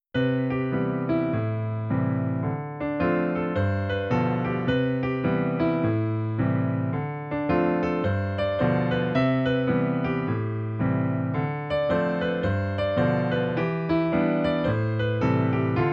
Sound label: 7th chords